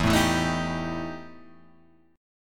E Minor Major 7th Double Flat 5th, E, down; strummed; JGuitar

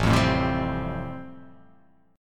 A-Minor 7th Sharp 5th, chord; x,0,3,2,0,2,2,x; Am7#5 strummed; JGuitar

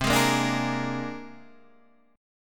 C 7th Suspended 2nd Sharp 5th C Guitar Open D

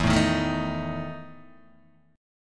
E Major 7th Suspended 4th E, Guitar, DADGAD; chord shape

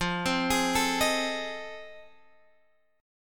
F 7th Sharp 9th, Guitar, Open G

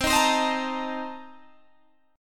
C Minor 13th C Guitar Open G

